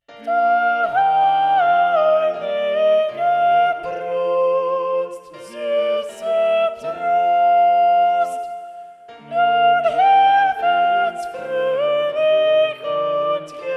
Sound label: motet soprano